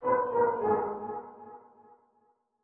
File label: ethno, 125, A